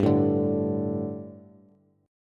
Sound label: G Suspended 2nd G, down, Bass; Gsus2 strummed; JGuitar